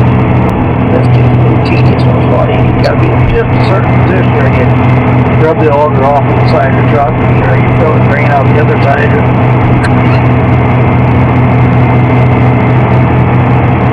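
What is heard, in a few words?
Inside Grain Cart Harvest Severe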